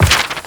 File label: page turn, book; ui